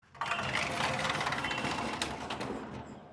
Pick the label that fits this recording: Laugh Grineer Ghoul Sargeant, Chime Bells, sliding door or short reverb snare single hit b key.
sliding door